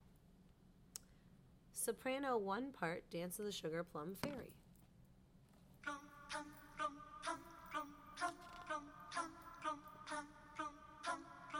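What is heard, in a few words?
Dance Soprano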